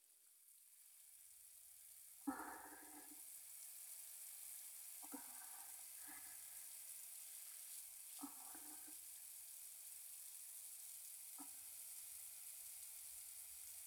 シャワープレイ, ASMR

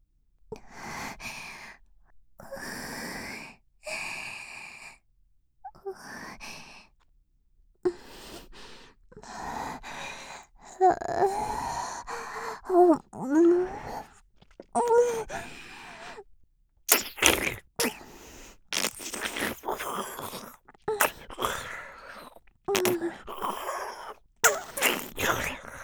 ストロークフェラ; おまけのフェラチオ

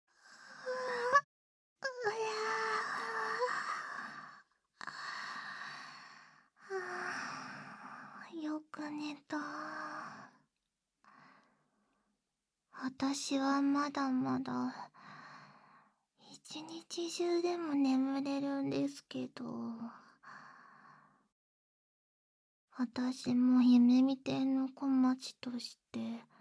耳かき両耳 バイノーラルマイク 効果音あり 大きめ

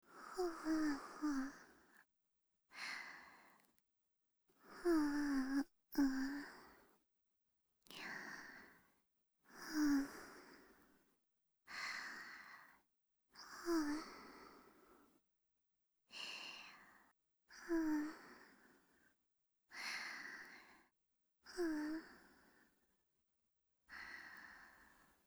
寝息リピート

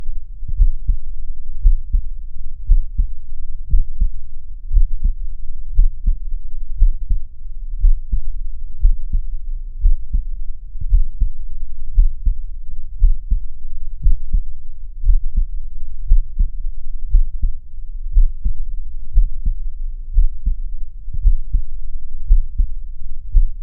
心音ループ

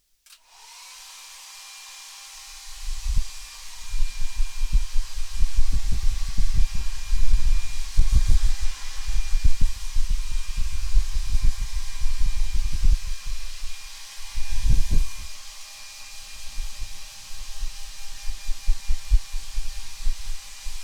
ドライヤー, ASMR

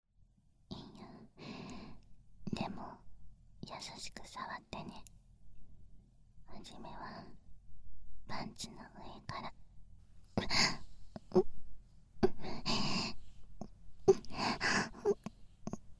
ねっちょり耳舐め, ASMR; パンツ越しに愛撫…

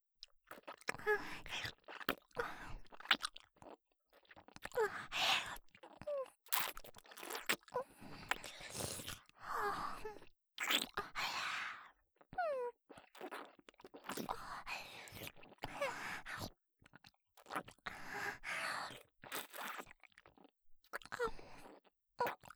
フェラ音

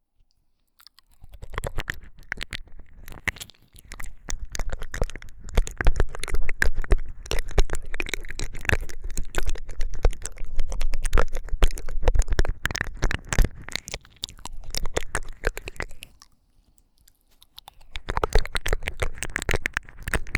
右にいったり左にいったりする耳舐め

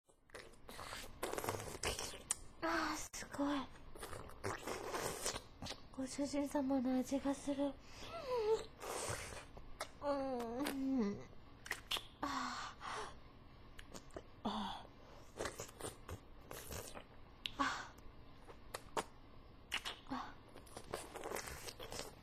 濃厚フェラチオ 手こきフェラチオ イラマチオ 口内射精 ザーメンゴックン お掃除フェラチオ; メイド; ご奉仕H

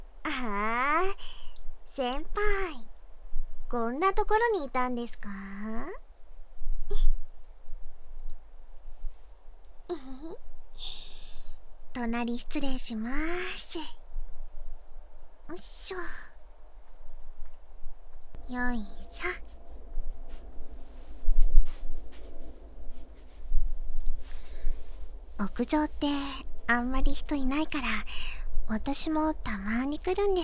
屋上でこっそり耳舐め（バイノーラルマイク 効果音あり）, ASMR; お昼休み

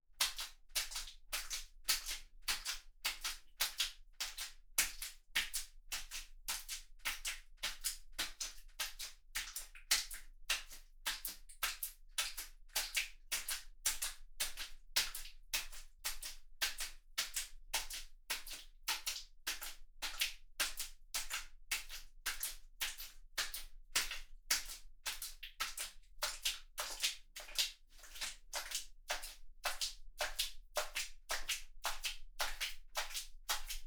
ピストン 中速; 3DIO; アダルトな音

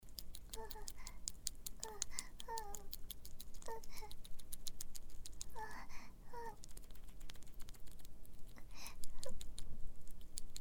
くちゅくちゅオナニー; メス犬奴隷のお●んこくちゅくちゅオナニー

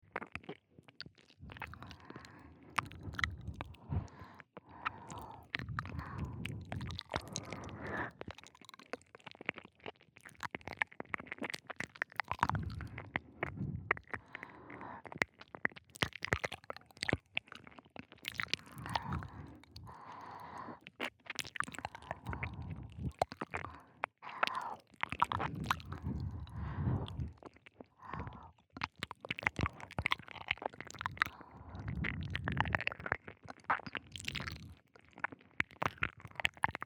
耳舐め左右; みみもと☆ぱるふぇ(ハイレゾ版); 健全☆ぺろこれ

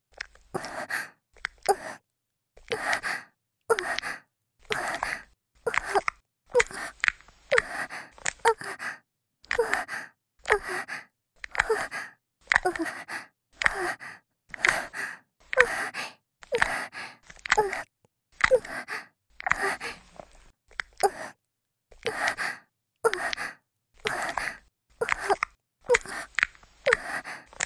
喘ぎ声のみ

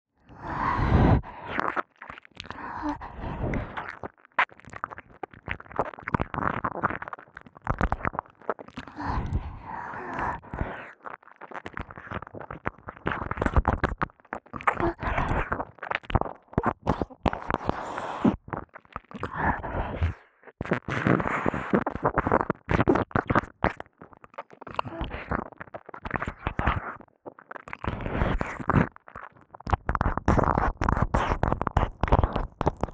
(左) 耳舐め じゅるじゅる; 耳の奥底で蠢いて～舐め音に溺れるバイノーラル～; 舐め音特化

